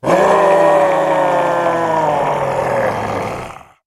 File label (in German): Monster